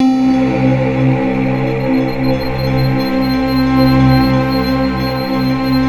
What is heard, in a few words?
NIGHT C3, NIGHTFALL; Sound Gallery - Charged